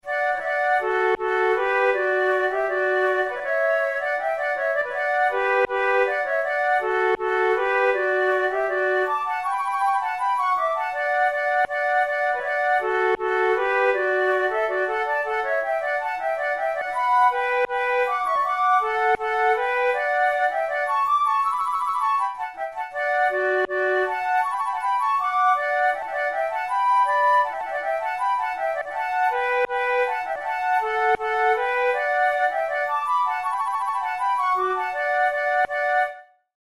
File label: duet